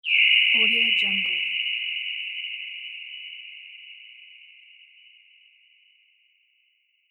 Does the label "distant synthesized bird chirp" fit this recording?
Yes